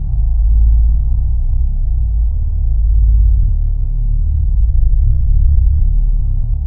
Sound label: space tone; Earth and Beyond; EA Games